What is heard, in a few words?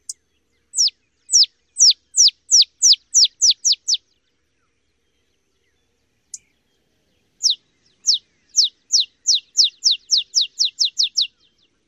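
song type, olive sparrow; TX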